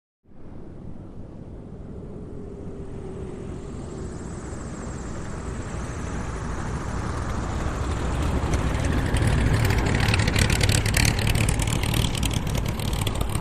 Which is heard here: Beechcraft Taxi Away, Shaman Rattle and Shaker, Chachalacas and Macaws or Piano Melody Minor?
Beechcraft Taxi Away